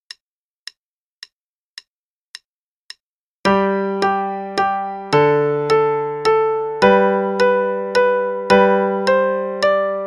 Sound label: Elegant Dance, qn=108; Play-a-long; Reinagle